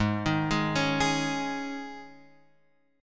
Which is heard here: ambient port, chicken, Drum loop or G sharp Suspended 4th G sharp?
G sharp Suspended 4th G sharp